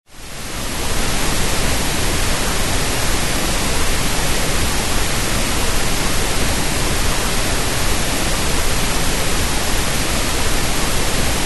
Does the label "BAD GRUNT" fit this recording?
No